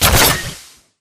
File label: Mvmt Servo Jump; AI Stalker; Titanfall 2